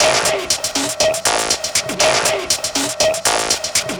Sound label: Combo Hat; Sonic Foundry (Sony Creative Software); Trance NRG VOL-2; Drums; Combo Hats